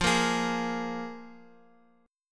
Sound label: F Minor Double Flat 5th, F, Guitar, Open F; Fmbb5 strummed; JGuitar